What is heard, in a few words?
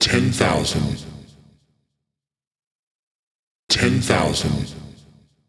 Vocal Loop 130, Techno